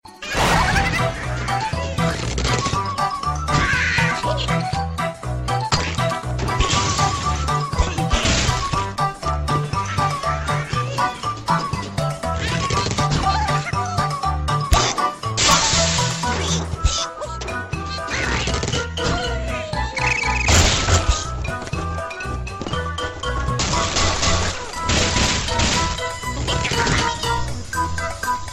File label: angry bird sms